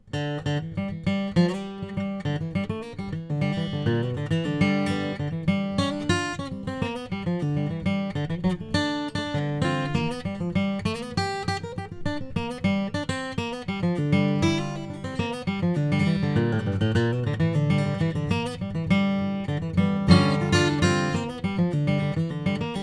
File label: Model J Cocobolo, guitar; Chris Bozung